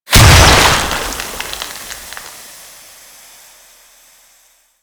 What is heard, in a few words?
Infested Moa Slow Bomb Explosion; Warframe; Attacks